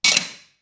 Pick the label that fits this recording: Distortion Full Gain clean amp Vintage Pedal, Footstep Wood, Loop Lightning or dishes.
dishes